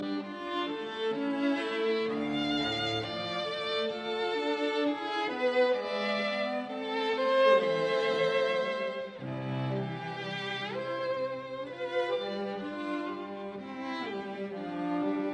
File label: Piano Trio in C minor Andante grazioso; Op 101; Mirecourt Trio